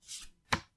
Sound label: flip; manic game